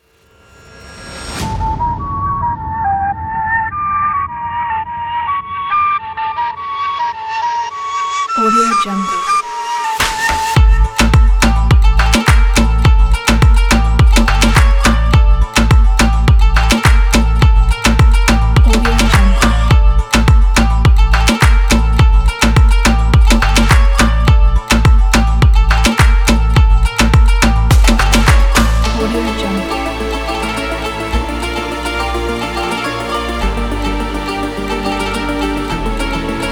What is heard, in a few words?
egyptian dark pop